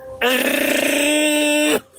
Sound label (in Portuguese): dinossauro